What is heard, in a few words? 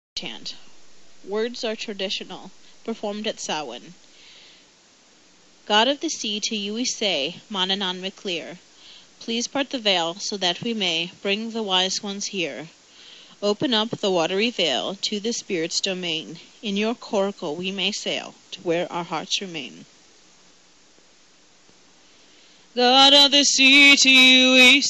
Chant; Gatekeeper Manannan